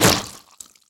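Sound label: zombie body impact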